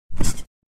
Cartoon jump bounce spring; boing

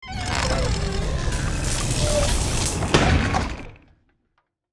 Sci fi door power energy open or close

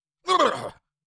Cartoon lion voice sigh surprise

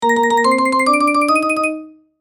Marimba win success level complete mobile game

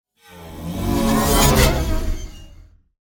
Metal wires transition

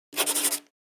Pencil scribbling on paper short and steady

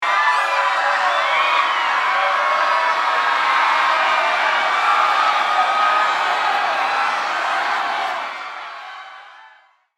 Panic crowd screams disaster chaos; scream sound effects

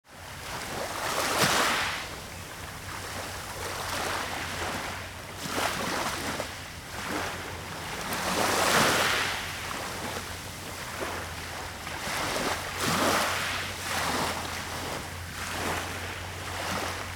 Stone beach heavy waves seashore

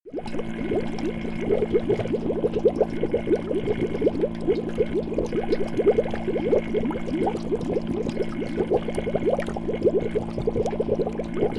Fish tank water pump aquarium bubbler

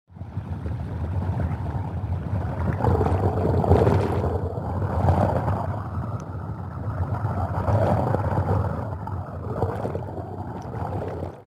Swimmer underwater movement

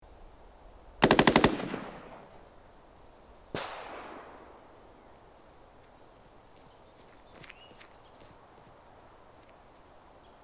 Nighttime battlefield with distant shots

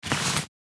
Handling jacket noise clothes; clothing

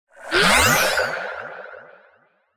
Magic anime spell cast

